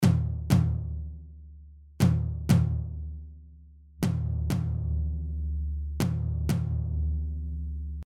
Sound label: Compressor